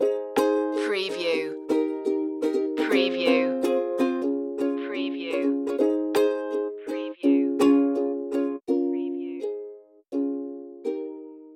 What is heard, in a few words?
a new day has begun loop